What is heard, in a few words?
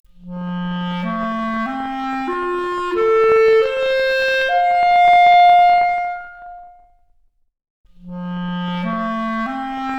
Clarinet arp, Stereo output noised; 3bit